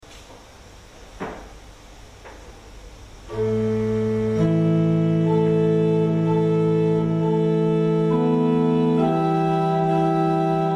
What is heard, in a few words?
chimney flute gemshorn